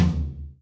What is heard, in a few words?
Tom Hit